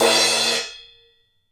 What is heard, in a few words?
CHOKE, crashes; Sampleheads; New York City Drumworks VOL-1; KD CRASHES